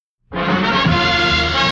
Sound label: Drums; Drums Monsters